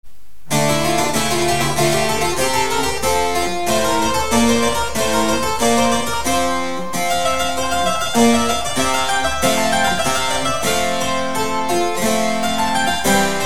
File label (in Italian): cembalo